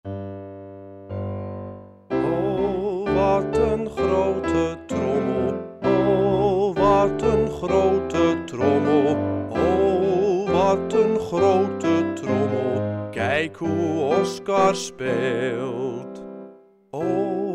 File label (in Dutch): De grote, grote trommel; muziekprogramma; Music Time